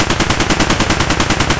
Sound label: ak105 distant loop; Escape from Tarkov